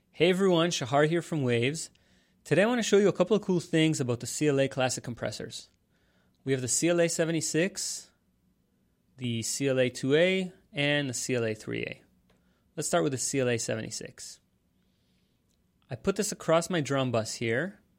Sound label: waves